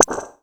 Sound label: pop